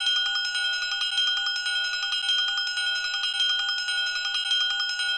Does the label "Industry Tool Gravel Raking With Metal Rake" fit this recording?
No